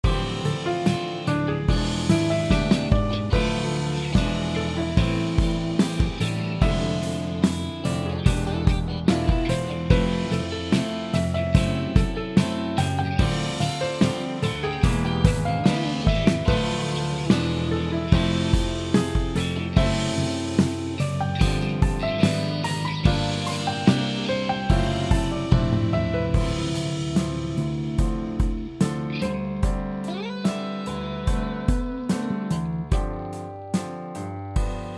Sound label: ballade